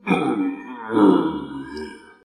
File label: mino stun